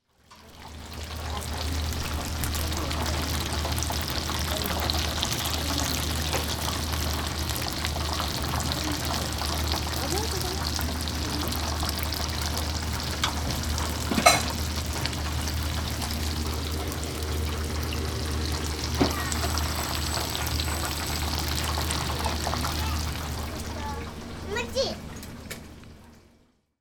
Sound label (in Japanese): tenpura wo ageru; Chino Cultural Complex